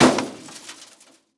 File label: Crash Impact Car Crash Hitting Trash Can With Light Glass; crashes; AdobeCC